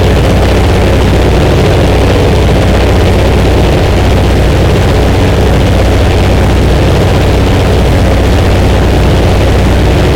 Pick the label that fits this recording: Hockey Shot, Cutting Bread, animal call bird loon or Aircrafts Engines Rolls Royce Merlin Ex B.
Aircrafts Engines Rolls Royce Merlin Ex B